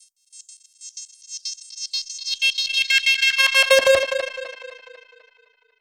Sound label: Filter Proc, alarm siren samples, 120bpm